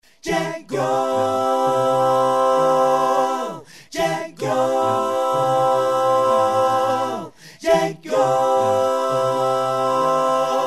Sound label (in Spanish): solo coro; llego la hora